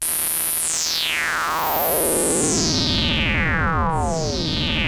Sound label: Moog D#3; Hollywood Edge; Giorgio Moroder Rare Synthesizer Collection; MLTI.MOOG